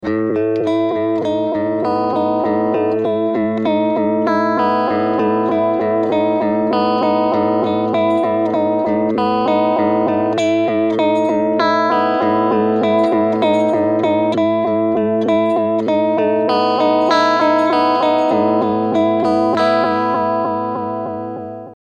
crazy vibrato

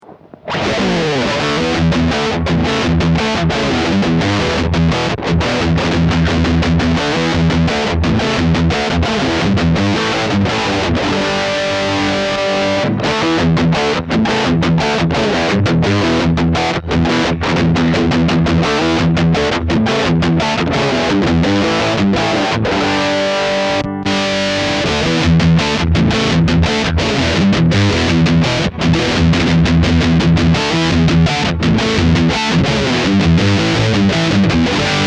Tech 21 SansAmp PSA 2.0 Mesa

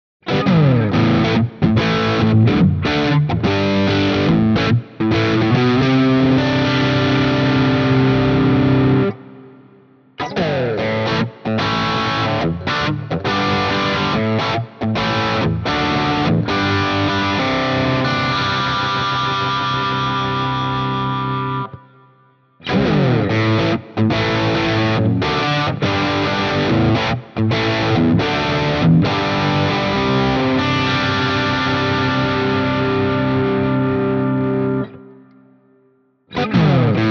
Guild crunch rhythm all positions

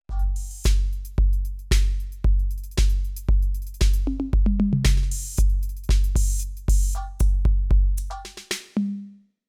Millenium MPS450 808

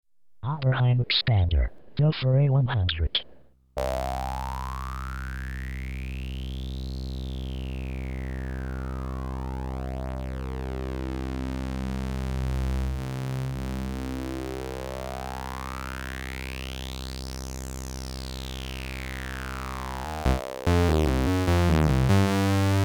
A106 1 Pole High Pass

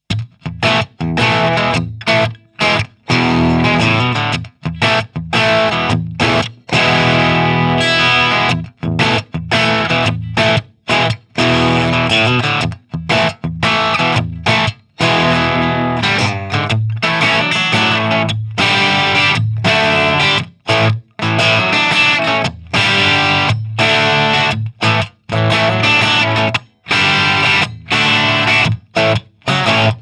Channel 2 tried to be clean

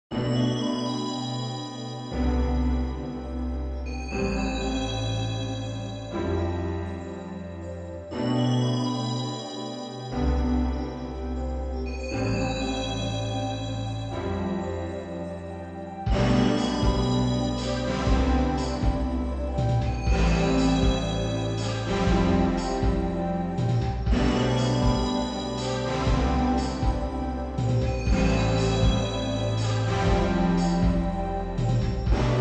Hardwaresynths wet Relab QuantX; Savant Audio QRS

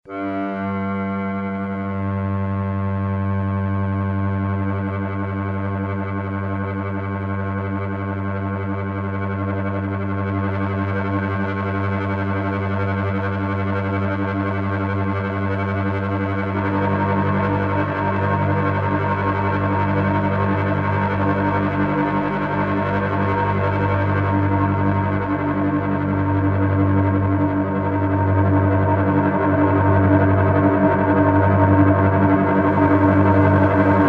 Cello

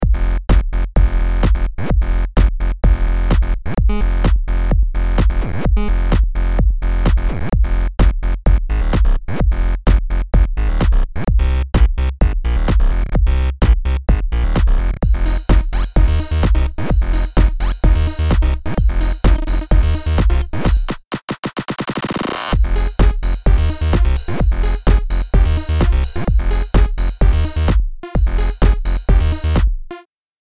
Melodic

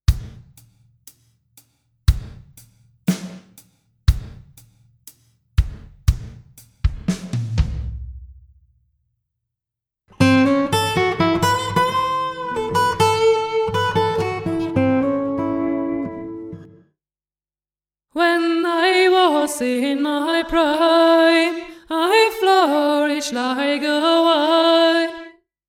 Nonlin 1

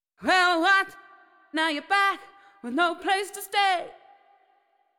Voc Hall

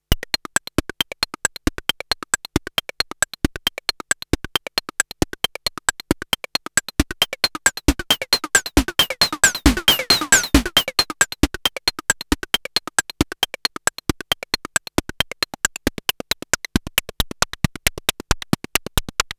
Behringer Edge

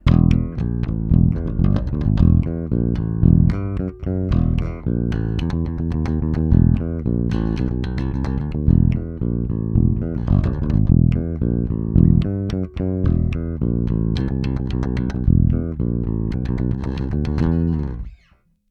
Flying V; Epi

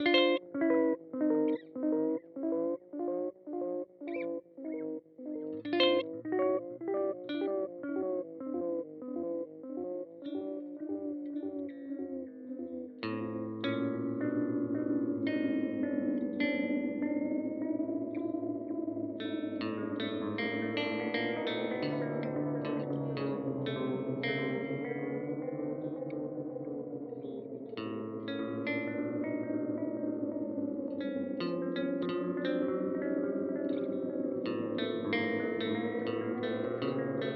Seymour Duncan Vapor Trail Modulation

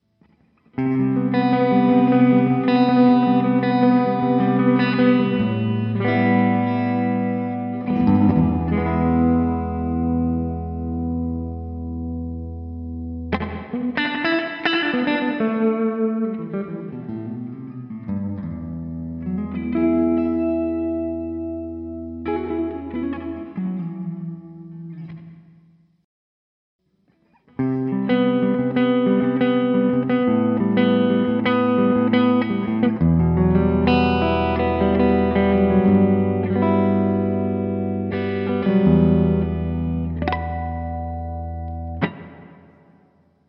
Room

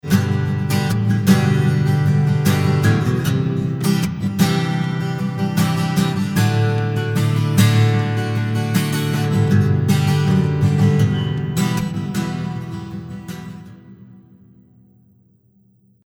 Git stereo; 25sec 0ms

